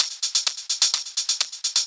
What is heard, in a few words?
Hihat Loop 128 BPM